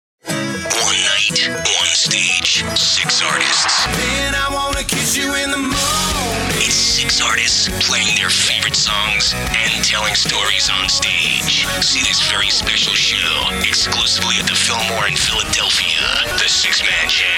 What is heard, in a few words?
Country